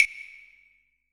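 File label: claves, JV-1080 drums; EdgeSounds; Drum Mashines VOL-1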